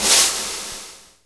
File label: Shake, Roland JX-305